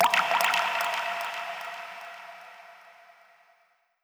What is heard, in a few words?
water drop fall into the water echo through the cave